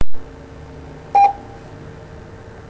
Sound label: Morse E